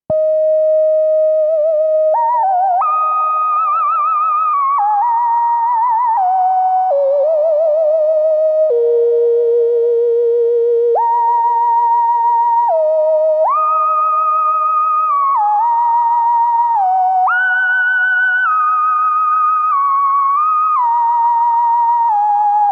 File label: lead 1 vco Sine